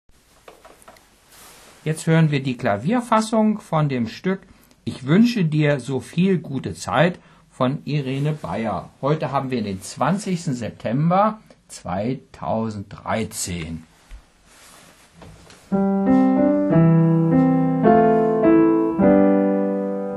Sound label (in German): Klavier